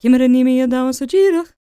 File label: GAELIC, World Voices, GAELIC FEM